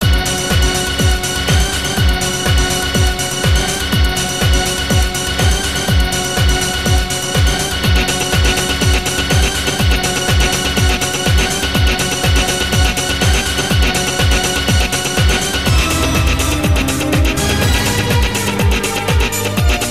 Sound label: Techno Version; Crank 2 High Voltage OST